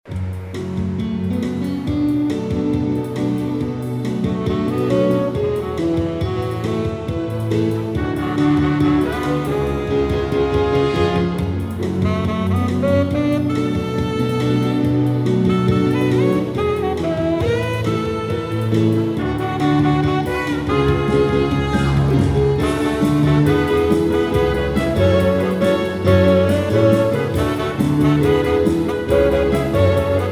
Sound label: solo sax, Piano e Cordas